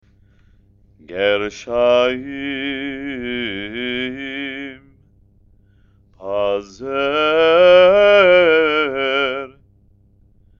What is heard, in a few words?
haftarah, trope